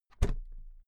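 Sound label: briefcase close, Büroarbeit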